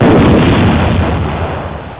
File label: sub war explode